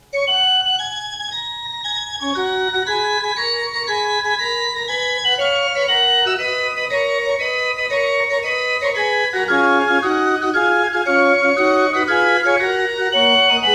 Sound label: Fuga in F; G Bohm